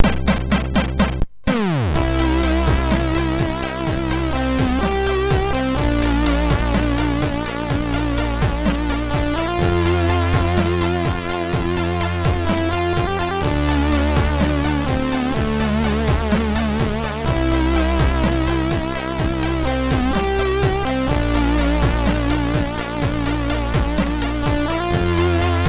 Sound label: Music sid MOS6581R2; Ice